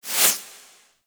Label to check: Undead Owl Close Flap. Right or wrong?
Right